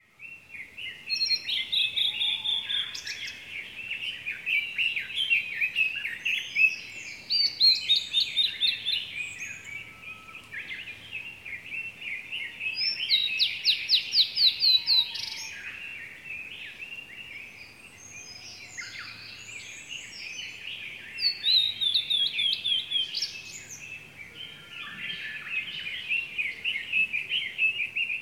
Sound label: Blue-and-white Flycatcher